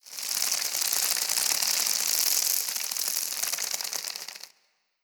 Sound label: Spider Raid Insect